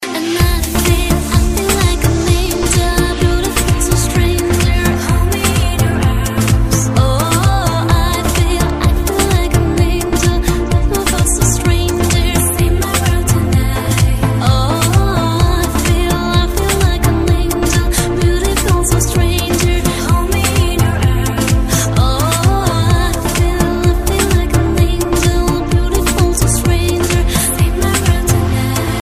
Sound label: romanian ringtone